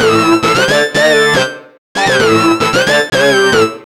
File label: Funky Riff, Keyboards